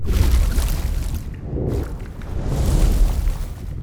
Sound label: aquatics dragon move